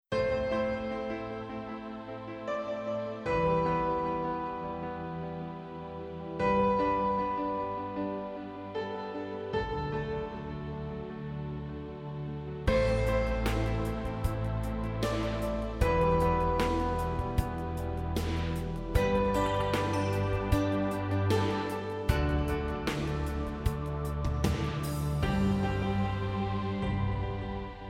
Orchestra National Style; orchestrated jingles

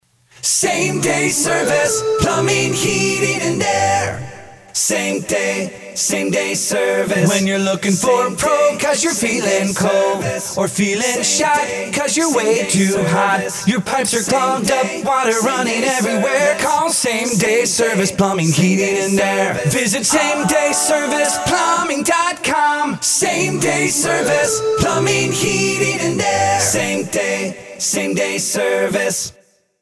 Acapella; Same Day Service Plumbing; acapella jingles

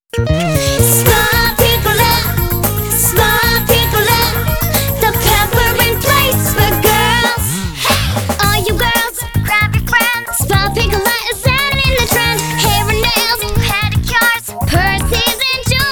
Full Sing, amusement park jingles; Spa Piccola

